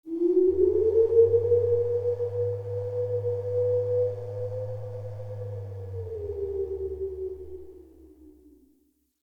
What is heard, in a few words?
Cave Creature; Glades Of Andurin